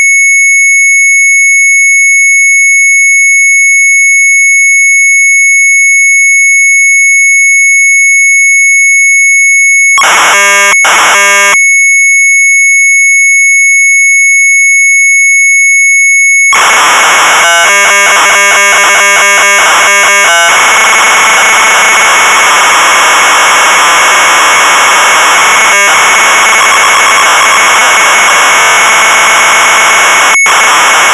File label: MZ800 Tape; SUPER MONDRIAAN; retromelsarchive; sharp mz800